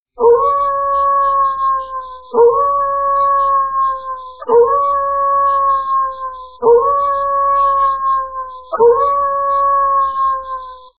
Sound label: Wolf Hound